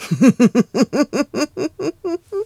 Lady Laugh Descending; The Lady laughing while descending and re-ascending (changing to her 3rd form)

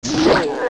Hit; Armos

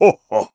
Chuckle; Gaepora chuckling; Skyward Sword

